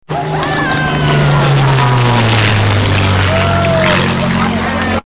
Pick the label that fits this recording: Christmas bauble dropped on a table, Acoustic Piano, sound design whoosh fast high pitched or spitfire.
spitfire